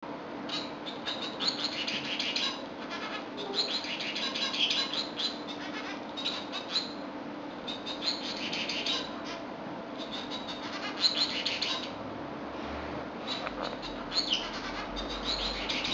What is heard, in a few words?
Bengalese Male, gouldian finch